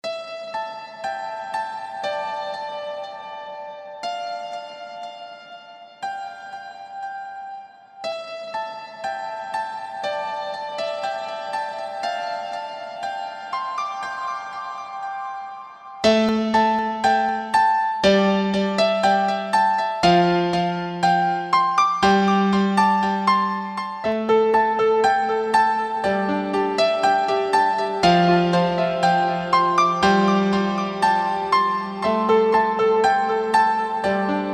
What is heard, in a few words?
Pianoo